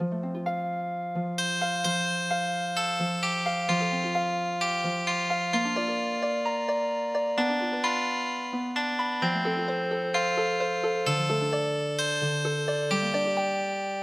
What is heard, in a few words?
Healing Harp; When You And I Were Young Maggie